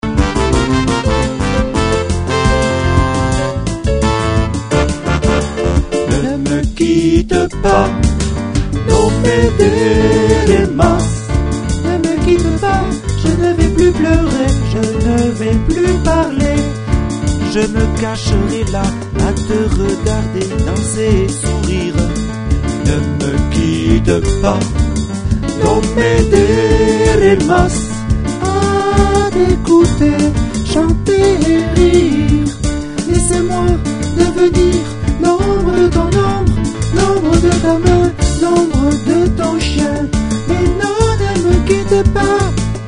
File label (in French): tutti